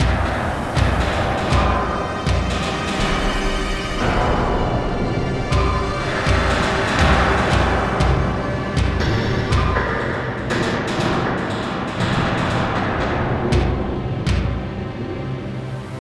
Danger Tonal; Insanely Twisted Shadow Planet

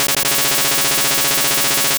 Solar Beam; sfx; attack moves; pokemon; gen 2; gsc

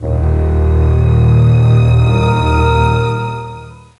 Forbode Industrial; sid meier s civilization revolution 2008 nds

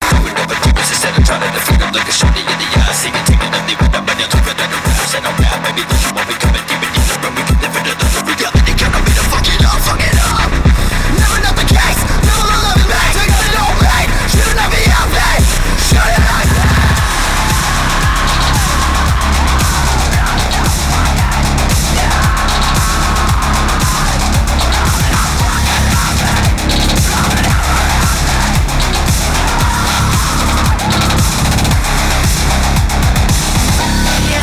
Menu Music; Cut the Rope

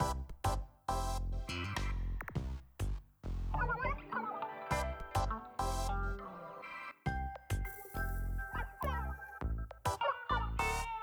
BGM Plaza Gear Shop

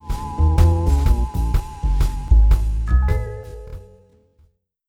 Game Over Theme; thief simulator 2018 pc gamerip

